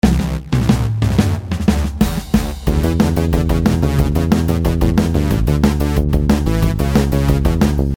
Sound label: rapid beat